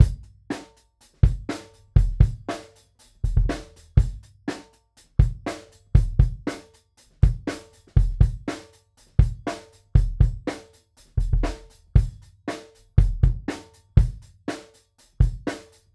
kick mapex i47 fet front; drums

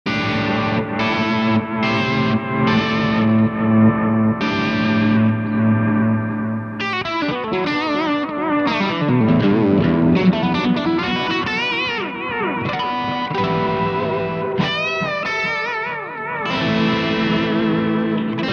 Echopark analog ducking strat blues driver; line6; tonecore; pod

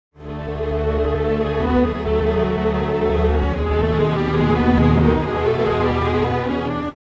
strings, timestretch

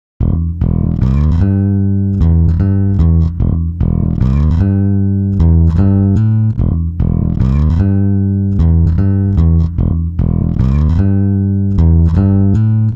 bass; digidesign pro tools ts; timestretch

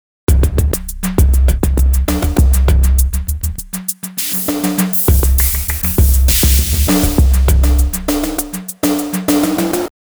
x loop basic; sugar bytes; effectrix

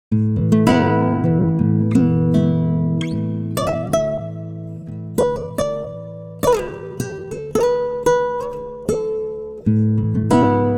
stretch, 75, timestretch, guitar; ikm sample tank

